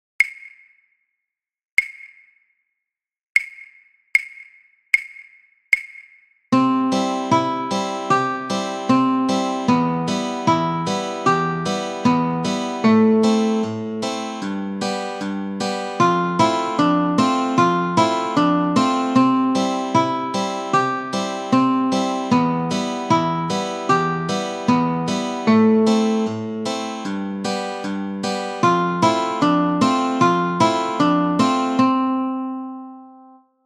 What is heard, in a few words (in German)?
ohne Bass